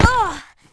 Bat Hit; urbanchaos

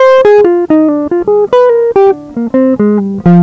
Guitar Licks; Sonic Foundry; On the Jazz Tip

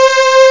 juno string; Epic Collection 3, The; Epic Marketing; muzak

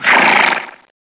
HORS BLOW; outlaws

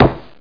KNOCK

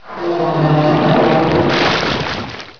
water gate, doors; Heretic II Demo

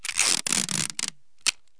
tape, office desk; KABOOM! - Wild and Wacky Sound Software

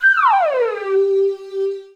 enemy drop to hole; wob2 demo

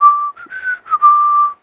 WELDER WHISTLE; Messiah

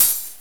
Hi-Hat Open; TG300B; Drums; GMPlay

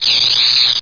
weld; mission sound

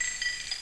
Mystic; Glider PRO

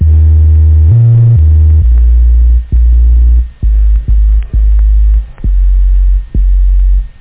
KILLER BASSLINE; PhatTrax

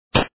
fireball; athlete

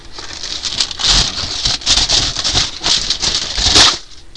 Aluminum Foil Roll

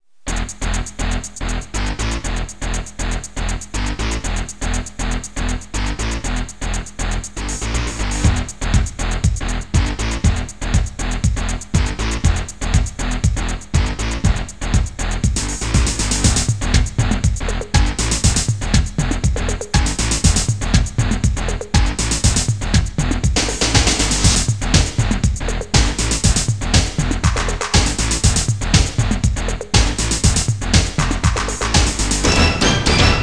hip hop; MIDI Master Collection 2

